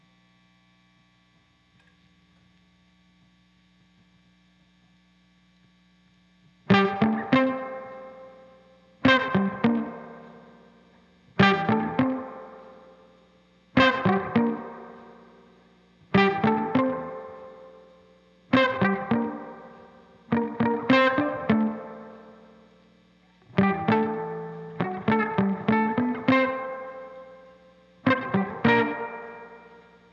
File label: guitar rhythm